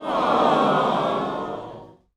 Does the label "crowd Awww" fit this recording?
Yes